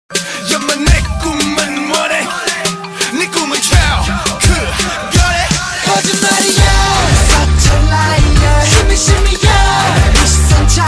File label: korean ringtone